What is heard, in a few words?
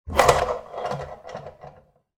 household bathroom scales step off mechanical noise return; zapsplat